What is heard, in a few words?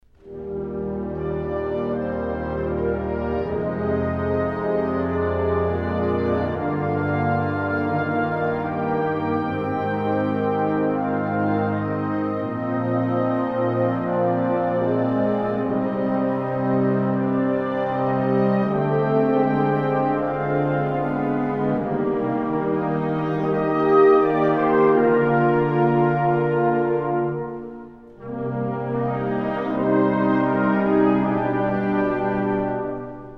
Chorale on a Scale